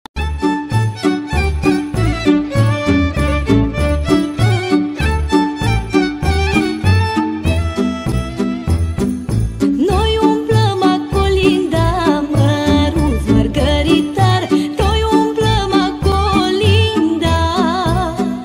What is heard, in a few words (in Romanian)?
Colind; Margaritar